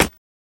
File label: Body Stab Single; gore stabs & eat